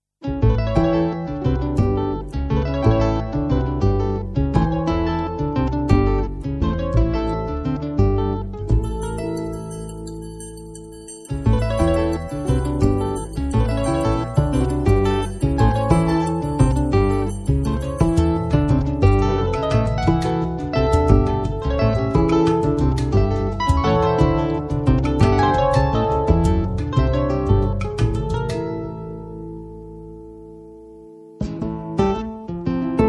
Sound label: Blues